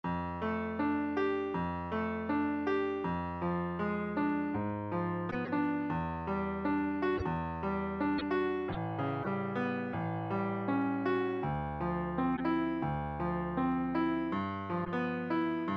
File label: tree arp